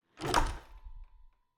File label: Cabin, front door, split door, oak, large, iron fittings, close, wide; Eiravaein Works; Vaeyan IV